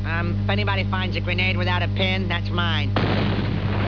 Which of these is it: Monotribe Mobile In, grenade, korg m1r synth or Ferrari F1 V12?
grenade